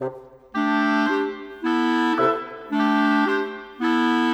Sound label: Winds, Orchestral; Sonic Foundry (Sony Creative Software); Orchestral VOL-2; Modern 21